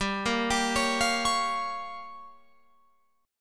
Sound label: G Minor 7th Flat 5th, Guitar Open F; Gm7b5; arpeggiated; 19,17,19,20,20,20